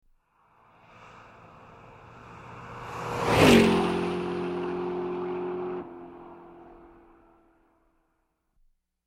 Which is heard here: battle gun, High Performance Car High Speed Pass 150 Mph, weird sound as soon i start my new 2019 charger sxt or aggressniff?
High Performance Car High Speed Pass 150 Mph